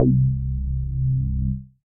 Hang Bass; Drive and Glow; Ableton; Factory Packs

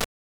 Kick and Bass Ambience; Convolution Reverb; IRs; Made for Drums; Ableton

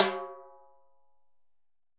Timbales B H Rim; Latin Percussion; Drum Hits; Ableton; Factory Packs

